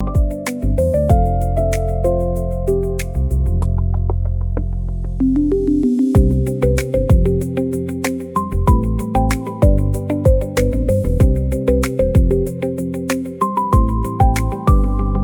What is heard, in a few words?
loop; bgm